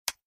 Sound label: Clock Arrows